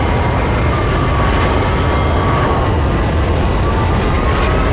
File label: pod steady lo comb loop